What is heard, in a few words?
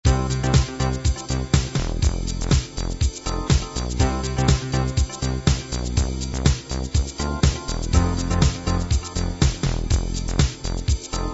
club dance